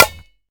Snare Drum Sample A#, acoustic snare sound tuned to the A# note